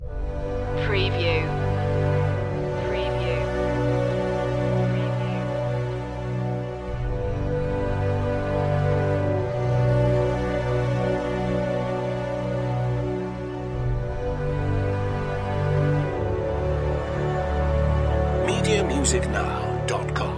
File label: age of exploration loop